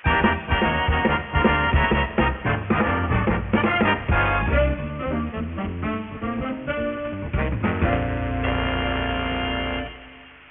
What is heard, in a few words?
jazzy intro